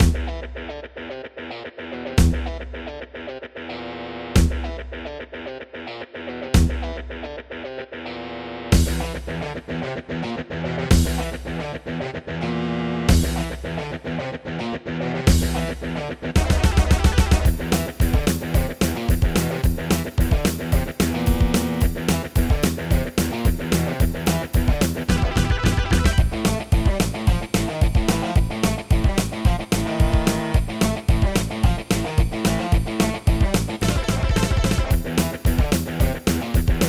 Yamaha SHS-500; Doom - E1M1

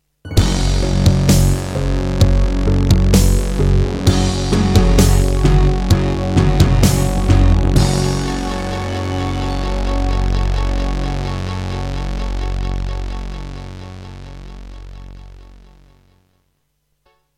Tascam Pocketstudio 5; Doom (Sigil); Introduction

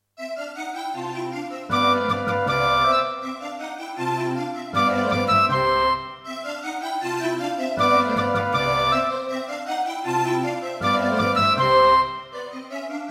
Yamaha DTXpress; classical; Mozart; Concerto K467 partial

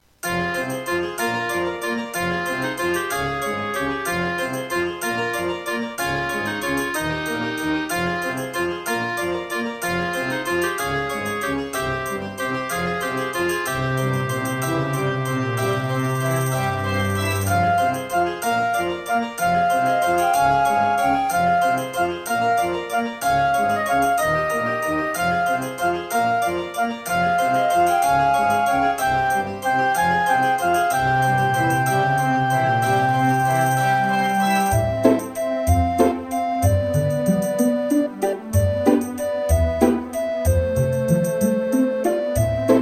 Track 6, Roland SC-8850; Death Gate

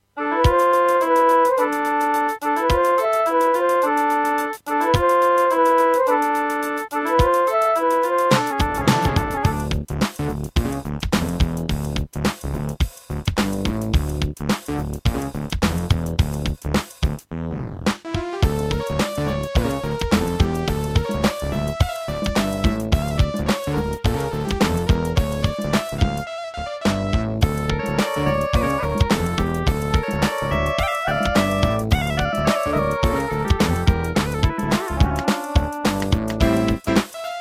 Diamond Monster Sound 0.5MB; Lost Vikings

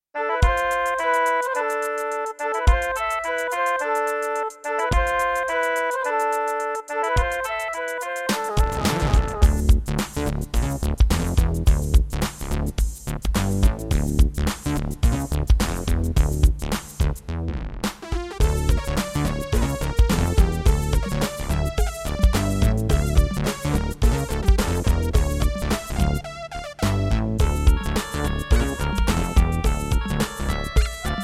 Egypt, Roland SC-88ST Pro 55MAP; Lost Vikings

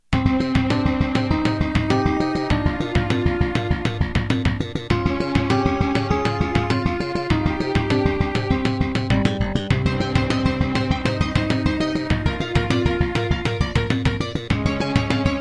Casio GZ-50M; Hocus Pocus

